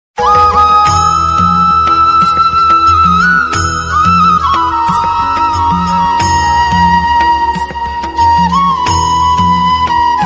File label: Little Krishna flute ringtone